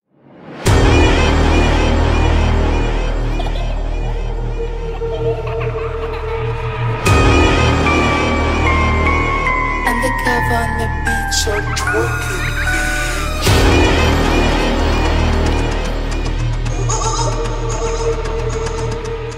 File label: halloween trap remix intro